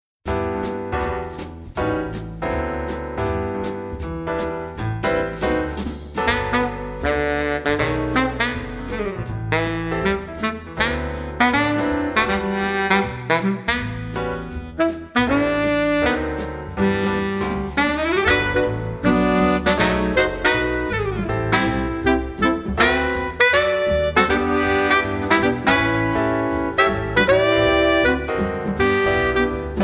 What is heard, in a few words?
Saxophone